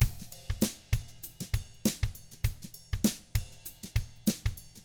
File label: 99 FUNKY, Drums; ILIO; Double Platinum Drums 1